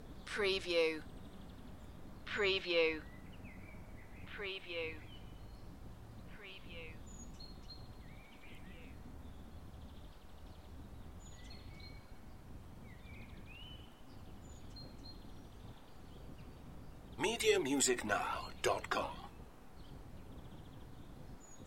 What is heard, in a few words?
Springtime Light Residential Ambience